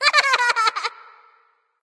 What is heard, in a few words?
laugh; Smite; Lunar Year Cupid